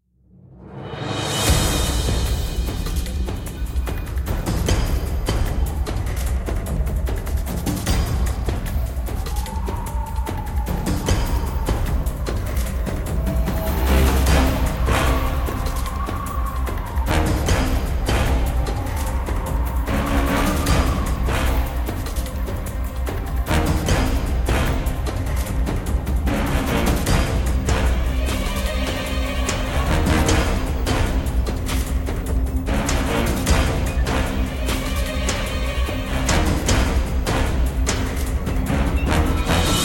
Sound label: snowmonster intro